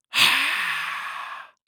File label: Horror Scary Vampire Hiss Airy